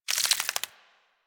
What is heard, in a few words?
Infested Ashen Move Turn; Warframe